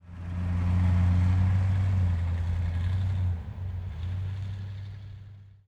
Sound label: amb pool; netent